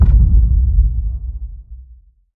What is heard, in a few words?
Large Ball Hit; Scraprun; Deathrun